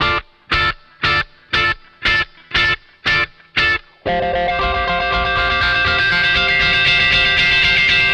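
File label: DISCO guitar WAH, 118 BPM, D; Drumdrops; Compact Disco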